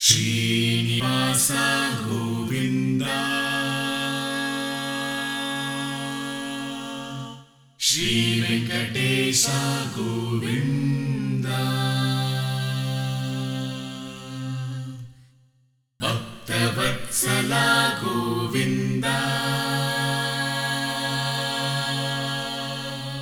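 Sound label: 60 C Choirs Rhythm Chant Fx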